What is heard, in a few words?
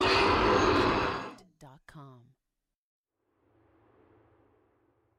big dino roar